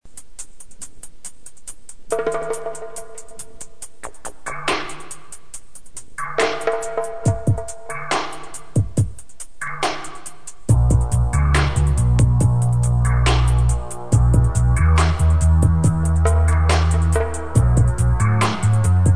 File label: drum